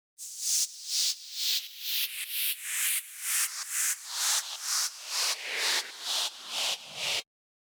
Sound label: 128BPM FX Noise; Vengeance; Essential Clubsounds Vol.5; Amstertaco Kit; Root Gmin